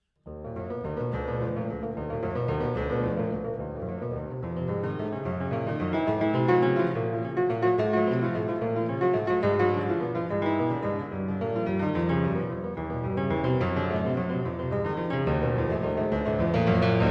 Preludes Op 28 No 14 e flat minor, Piano; Frederic Chopin

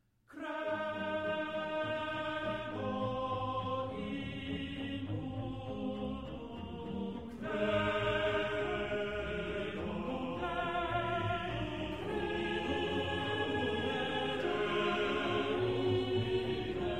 Credo in unum Deum; Bach; Messa in si minore

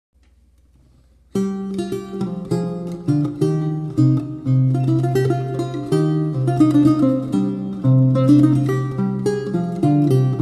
Branle Gay, Lute; C'est mon amy; Pierre Attaignant

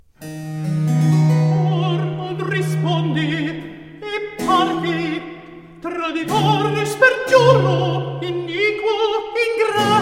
Cantata for alto 2 violins continuo Recitativo; Medea in Corinto; Antonio Caldara

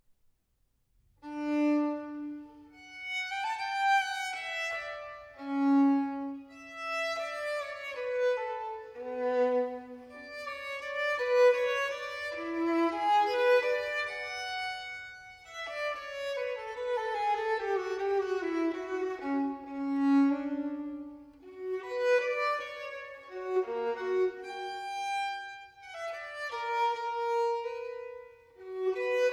Fantasie for Violin Solo in E Flat Major Dolce; Georg Philip Telemann

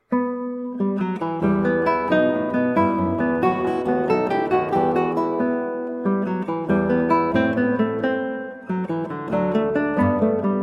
Vivace, Guitar; from Partita 5 in E Minor TWV41 E1; Telemann; Georg Philip Telemann

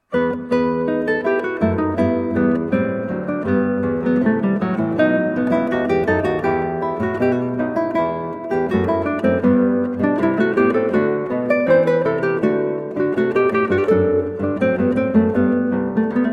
Allemande from Trio Sonata in E Minor, Guitar; Handel; George Frideric Handel; HWV398

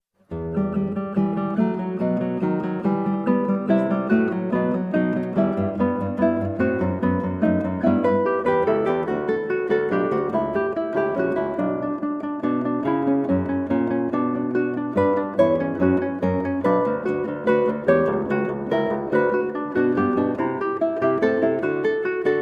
Presto from Trio Sonata, Guitar; TWV 42 E8; Georg Philip Telemann

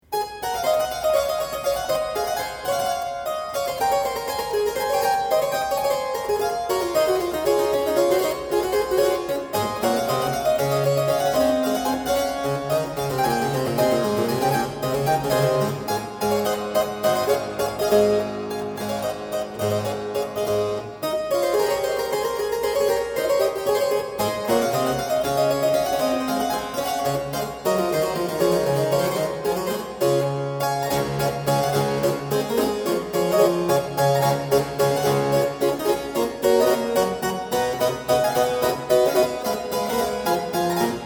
Trumpet Overture - Allegro; William Croft; Keyboard Music

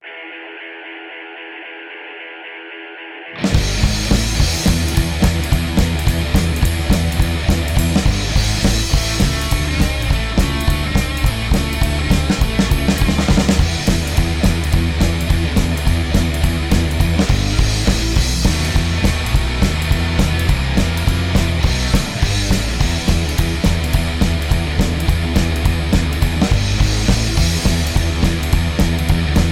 Blues, Instrumental

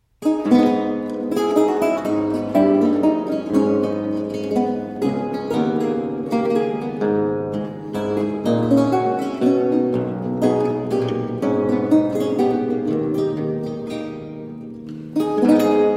Duet, Courante in A Minor, spoken, Lute; Anton Logy

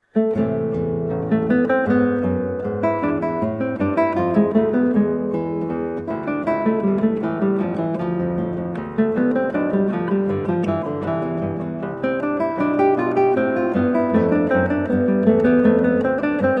Allemande in A Minor, Guitar; HWV 478; Handel; George Frideric Handel

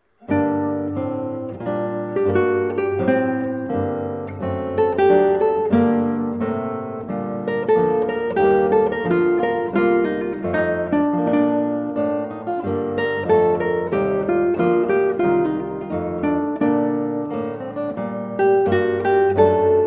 Adagio, Guitar; Recorder Sonata in B-Flat Major HWV 377; Handel; George Frideric Handel

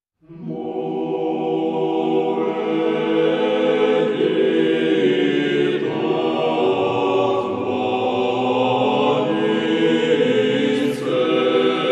Georgian chant, Church Choristers; Come let us worship

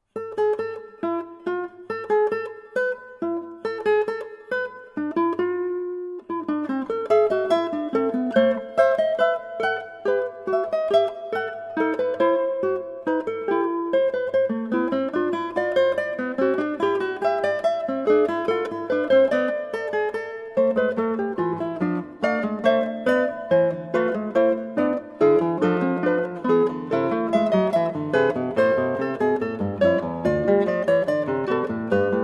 Fugue, 8 String Guitar; Fugue 2 Book 1 Well-Tempered Clavier BWV 847; Bach

